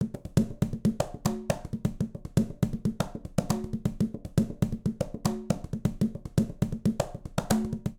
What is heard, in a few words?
Baion B Tumba-120bpm; Latin Percussion; Ableton; Factory Packs